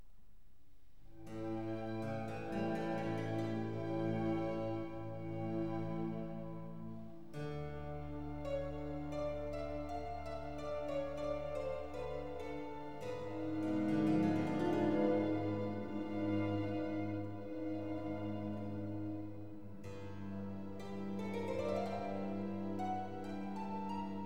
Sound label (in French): Concerto pour 2 trompettes en Ut majeur, 2e Mouvement; Vivaldi